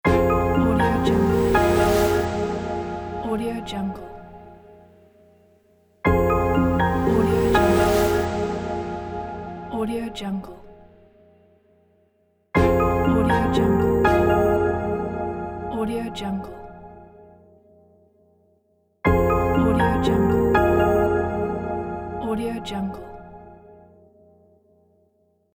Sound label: clean reveal logo; konstantin